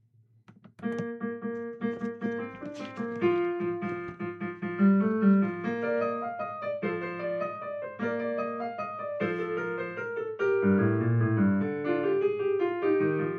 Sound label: Gloria In Excelsis Alt Bass; rehearsal tracks